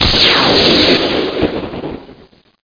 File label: Power Zap